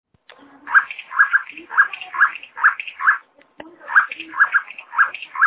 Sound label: dublu frog